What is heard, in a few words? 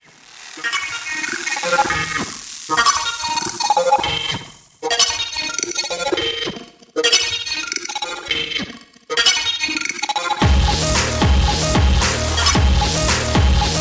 ambient music